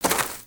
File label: Footstep; Lego; Strombie; creatures organic